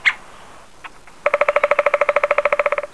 Picoides tridactylus

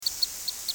Calidris minuta

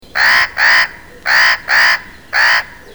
Nucifraga caryocatactes

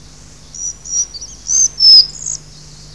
Ficedula albicollis

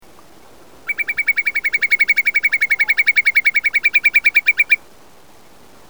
Numenius phaeopus

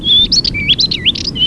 Sylvia conspicillata

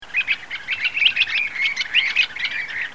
Calidris maritima

